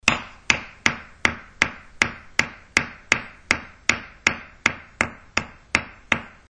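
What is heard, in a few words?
Hammering Sound; Lisa Redfern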